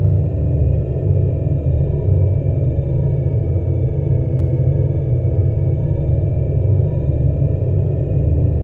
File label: ambiance; zombiesurvival; skilltree